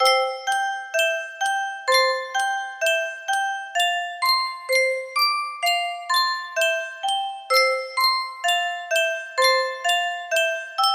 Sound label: Sankyo Music Box, music box melody